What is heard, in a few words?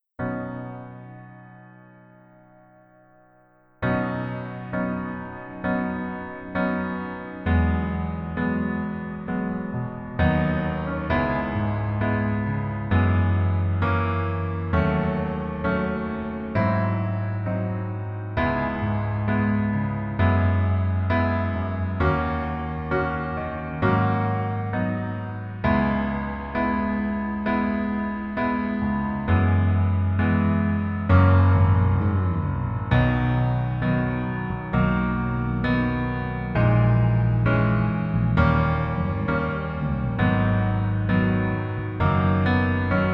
Piano